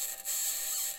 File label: mic, mars2020 edlcam